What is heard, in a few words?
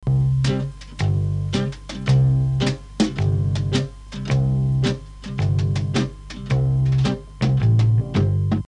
slow foxtrot